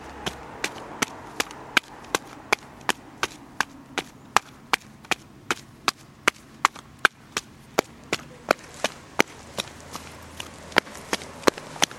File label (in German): schritte